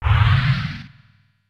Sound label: Hroom; Super Smash Bros